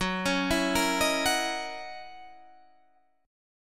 F sharp 13th F sharp; F#13; 14,16,14,15,16,14; arpeggiated

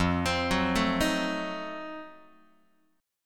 F 7th Flat 9th F down Guitar; F7b9 arpeggiated

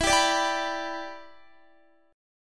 E Minor 7th, down, Guitar; strummed

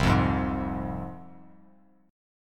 C Suspended 4th Sharp 5th, C, down, Bass Standard; Csus4#5 strummed; JGuitar

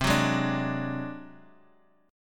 D 7th Flat 5th C, chord

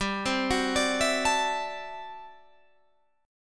D 9th G down Guitar Standard; arpeggiated; JGuitar

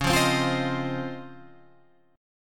C sharp Major 9th, Guitar, Open G

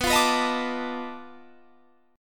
B 7th Sharp 9th Flat 5th, down

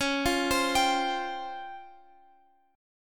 Db Major Sharp 11th Db Guitar Open F; DbM#11; arpeggiated; x,x,13,12,15,14

